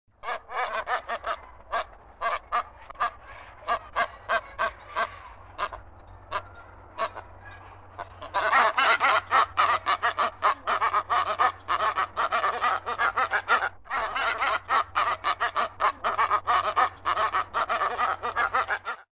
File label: Brown Booby, songs